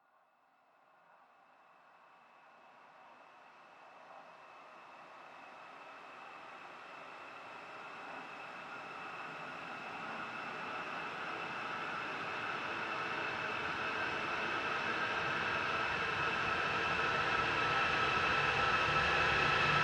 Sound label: Horror Build Up; End Of Days